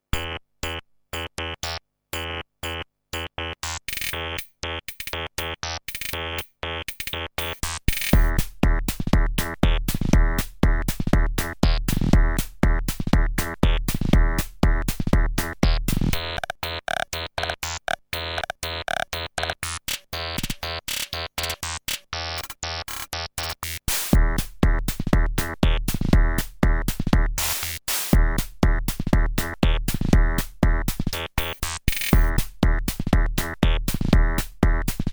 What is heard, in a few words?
Kilpatrick Carbon Ratchet Keytranspose